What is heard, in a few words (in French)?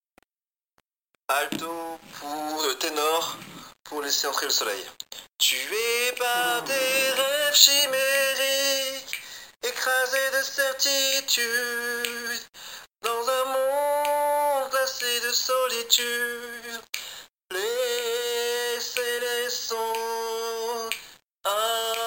Tenor